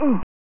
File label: Champion Wounded, Amiga; Dungeon Master and Chaos Strikes Back